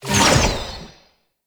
Mirror Defense Rune Shot Cancel; Ambience; Gameplay; Warframe; Entrati

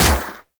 Shot, Akimbo Pistol; Grineer; Prisma Twin Gremlins; Weapons

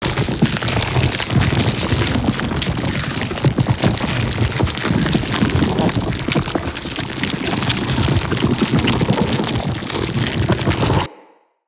Inside Sphincter Loop; Ambience; Bromius

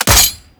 Ghoul Sawman Shot End; Warframe; Grineer Ghouls

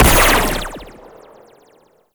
Laser Fire; Amalgam Carrus; Abilities

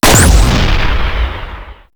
Null Star Fire; Warframe; Warframes; Antimatter

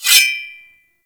Blade Swipe; Warframe; Characters; Nemesis Boss; Blade Stabs

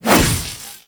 Whoosh; Warframe; Weapons; Tenno; Revenant; Nikana; Tatsu Prime

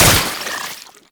Mech, Infested Arm Cannon, Weapons; Warframe; Coda Bubonico

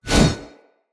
Whoosh, Swords; Weapons; Mockery; Mockery Stultion

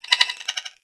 Beep Stutter; Warframe; Corpus

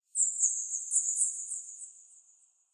Bat Chatter Distant, Ambience; UnderCity

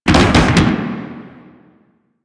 Motorcycle Slide Boost Backfire; Vehicles; Warframe; Motorcycle Ninety Nine

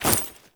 Generic Foley; Warframe; Uriel

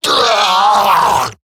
Death Eximus Grineer Male; Battle Barks

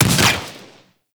Projectile Explosion; Enemies; Abilities; Warframe; Sentient; Brachiolyst

